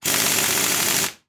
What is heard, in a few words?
Industry Tool Run Half Inch Impact Wrench